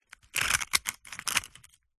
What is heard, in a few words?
egg shell movement crack lightly; zapsplat